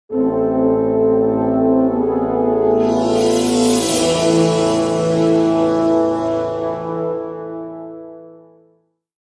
Medieval Foreboding; Civilization Revolution; Xbox

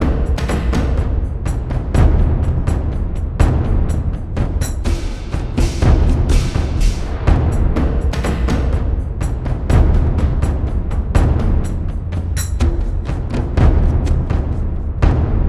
Caves Drums Loop; Ninjago Monastery; lego universe macos windows gamerip 2010

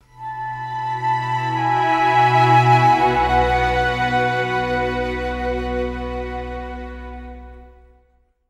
Ambient; Rise of Nations: Rise of Legends

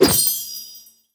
Daily Gift Appear

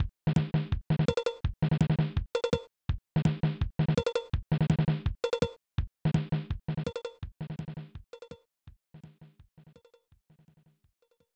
Drumbeat; fun n games 1994 snes

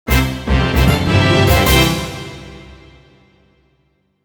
Credit fanfare; Trouble Witches AC; クレジット

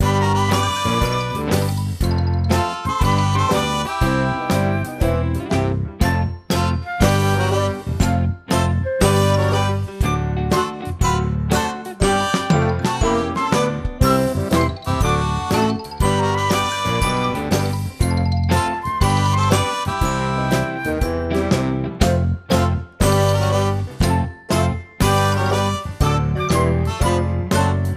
strolling music

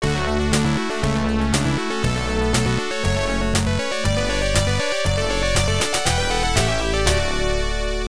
Jingle C; M.V.P.; System 16B arcade

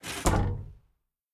Door Slide Close Glass; Devour; The Inn

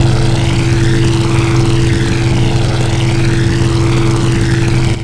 Engine Tun Gear

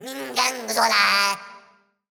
Necromancer Attack Vo; Android; Portal Quest

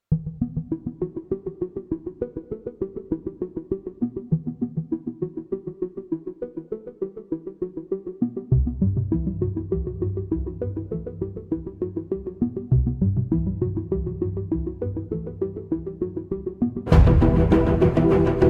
Spooky; The Sims 4; Terroir

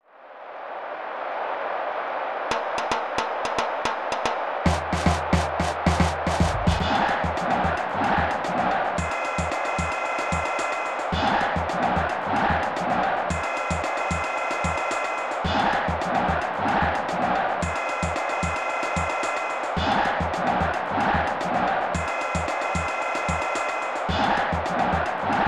Soccer Game Ambience; J League Soccer Prime Goal 3; SNES

